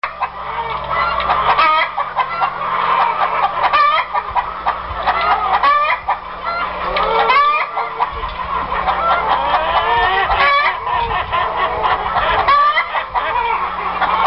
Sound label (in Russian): kury v kurjatnike